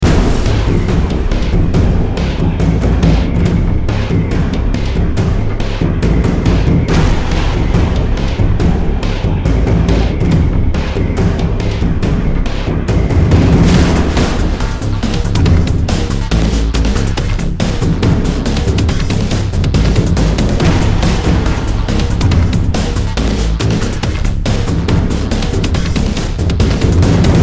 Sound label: dark loop; gamerip; Gun Frenzy; ios gamerip 2014